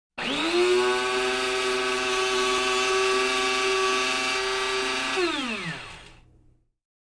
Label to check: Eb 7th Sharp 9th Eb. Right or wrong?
Wrong